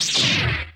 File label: saber on fast; lightsaber